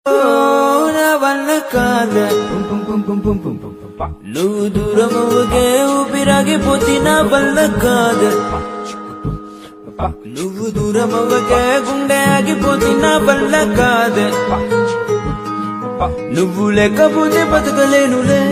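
song background music ringtone romantic movie background music ringtones telugu background music ringtones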